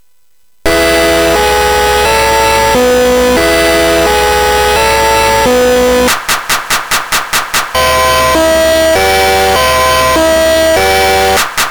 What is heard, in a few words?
Song, MOS6581R4; hvsc